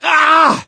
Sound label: hurt major, gambler, survivor voice